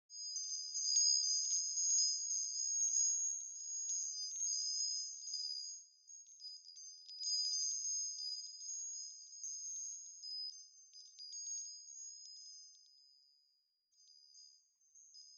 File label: chimes